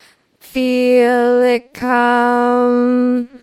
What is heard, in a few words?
singing